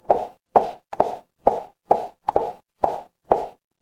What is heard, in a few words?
footsteps heels